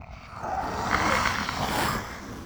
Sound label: pinky chatter, monsters vocalizations; DoomRTX